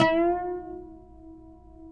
PLK OUD, Roland LA Composer

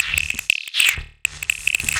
Clickers, 120 BPM, Percussion; Sonic Foundry (Sony Creative Software); Underground UK House & Electro

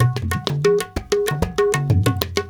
India, Heart of Asia

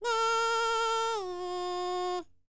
BULGARIAN, World Voices, BALKAN

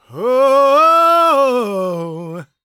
gospel male, vocal; ILIO; Vocal Planet

